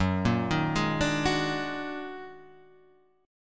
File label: Eb Minor Major 11th